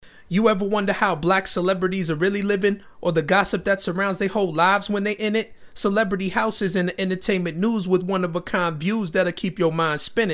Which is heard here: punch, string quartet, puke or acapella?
acapella